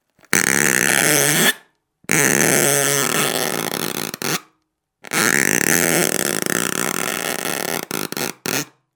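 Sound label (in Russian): звук трения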